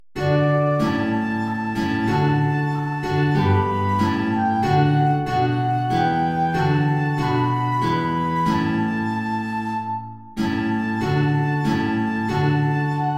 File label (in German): Querflöte & Gitarre (hoch); Lobt Gott, ihr Christen, alle gleich